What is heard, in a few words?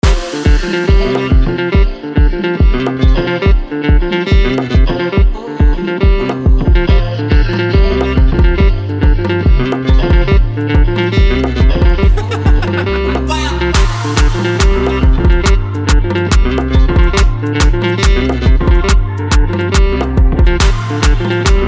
140 Dm